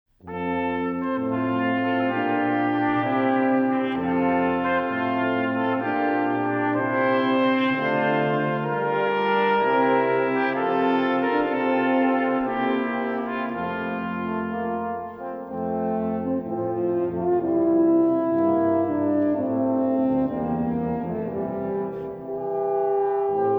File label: water music